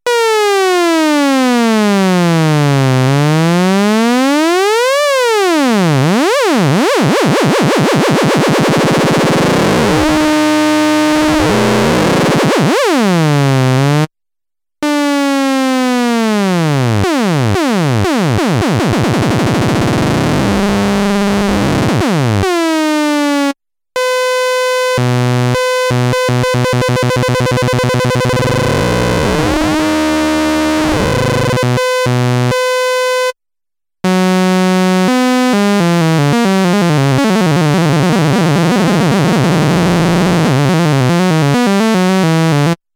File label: lfo, nanozwerg; test: LFO shapes; MFB nanozwerg